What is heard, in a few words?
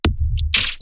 weird bass